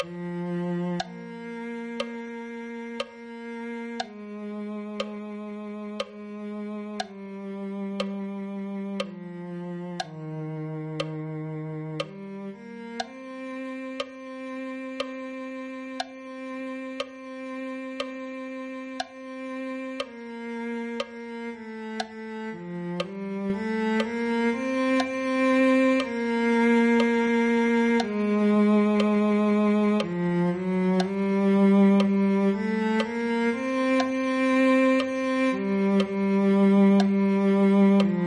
cello

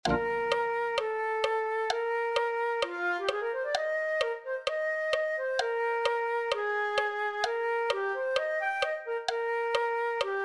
flute

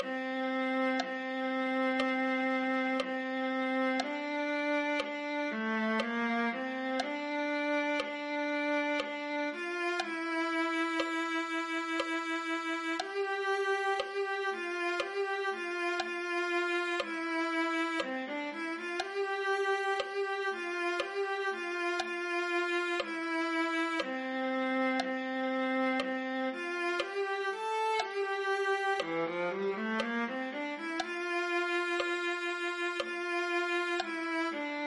viola

